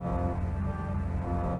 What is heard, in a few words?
Startup; Windows 13-15; Windows Never Released Soundpack 1; deviantart